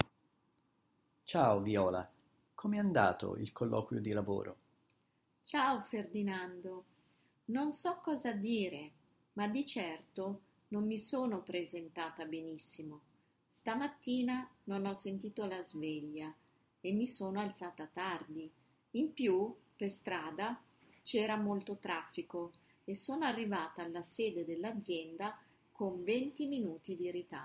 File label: viola